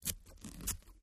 scissors cut paper

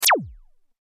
Laser Gun; lasers

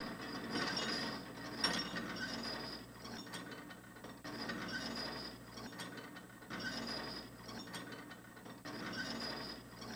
Metal Weight Machine With Weight Hits Cut Out

